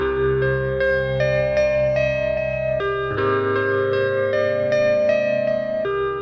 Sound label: keys, jazz loops